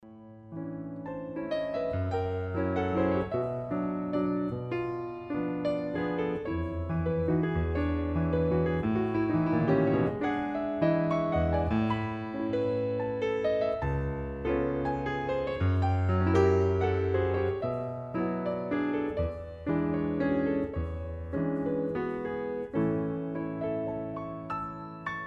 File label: valse